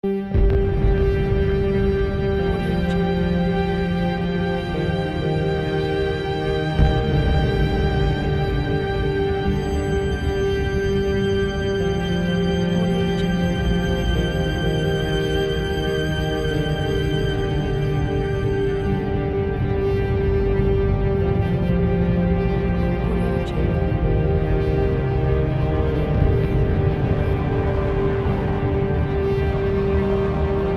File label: rain on the battlefield